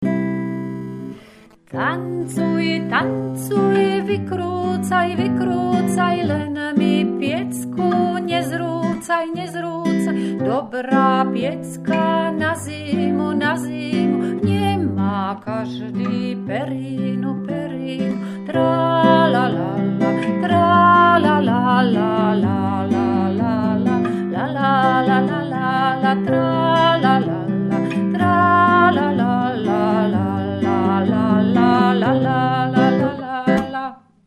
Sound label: tancuj voc; slowa